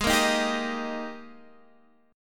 G sharp Major 7th Suspended 2nd; strummed; x,11,8,8,8,11; G#M7sus2

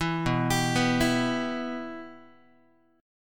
B Suspended 4th Sharp 5th down Guitar; Bsus4#5 arpeggiated; JGuitar

